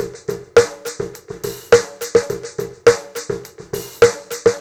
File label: Crunchy 104, Beat Loops; Zero-G; Monster Beats